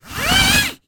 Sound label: animals dog leash lead extendable retractable short pull